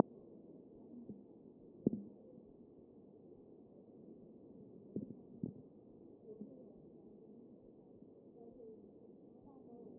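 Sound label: anterior chest, position 1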